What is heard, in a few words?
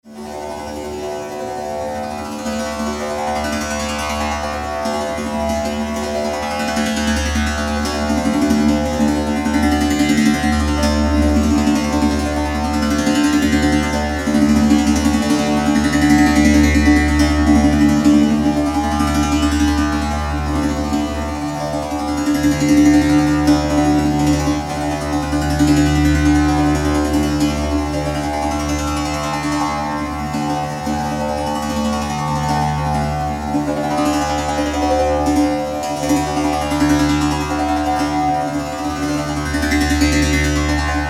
MultiChord 160cm monochord